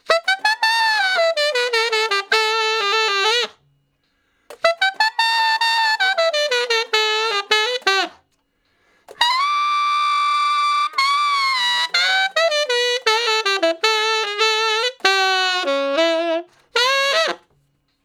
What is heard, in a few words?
Sax Shuffle (E), Ten Sax; Solos; Crimson Blue and Fabulous Horncraft 4 RnB; Horncraft for R&B